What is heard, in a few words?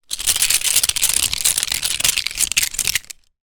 household plastic cutlery many handling